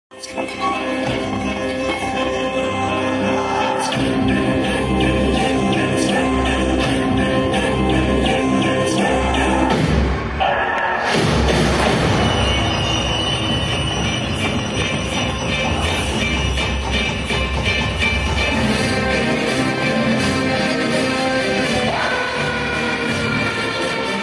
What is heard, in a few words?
post credit scene bgm horror; stree 2; stree 3; akshay kumar; horror universe; rajkumar rao